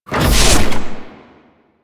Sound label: Door Close; Warframe; Quests; TheNewWar; Ambience; Drifter Camp; Ambient Gameplay; Liset